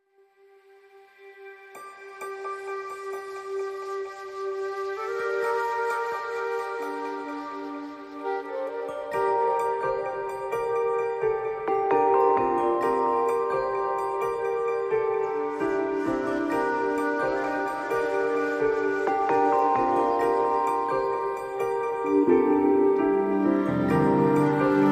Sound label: No Perc